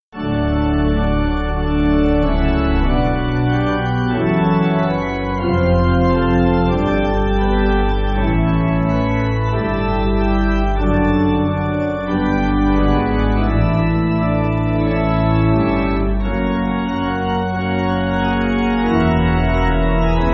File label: When In Our Music Pipe